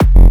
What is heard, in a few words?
KICK BASS F ONE SHOT